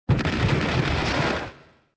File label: grapes 2 bort; shipcharge; Pirates Of The Caribbean